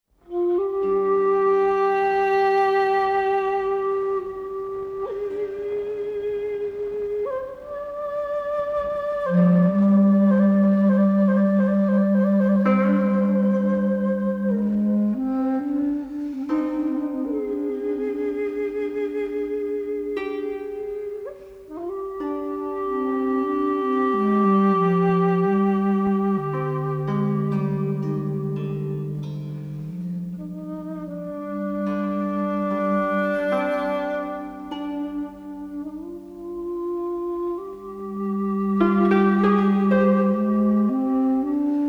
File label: relaxation music for zen meditation